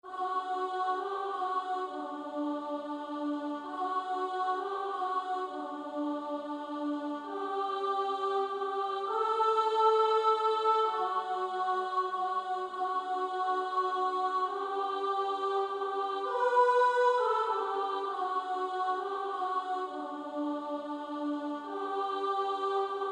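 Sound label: practice music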